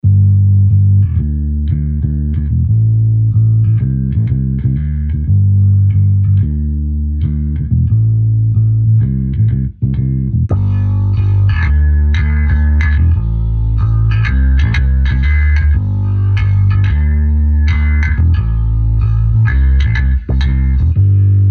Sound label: Bass